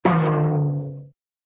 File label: peeong; cartoon; hannah barbera cartoon sound fx